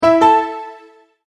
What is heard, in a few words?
multimedia tone verified